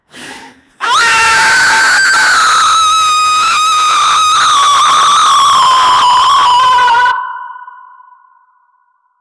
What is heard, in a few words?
terrifying banshee shriek; spectral